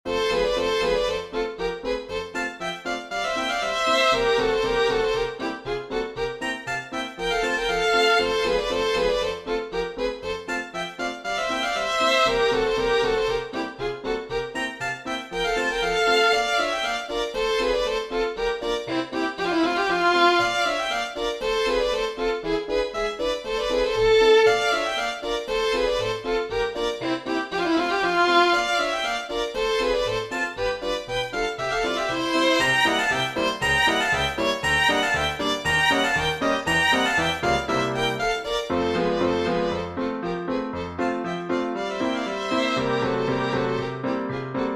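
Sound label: POLKA for 2 violins and piano; Dankla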